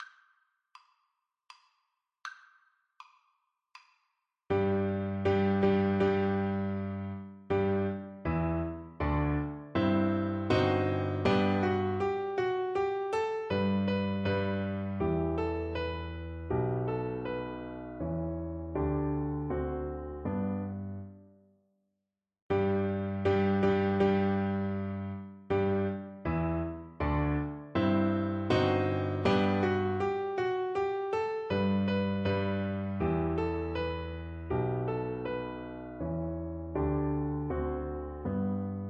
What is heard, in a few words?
Oboe